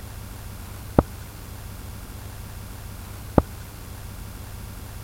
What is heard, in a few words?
vibration sound solid glass